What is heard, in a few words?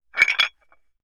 Metal; TOOLKIT vol.2; DET24 ElementalMysteries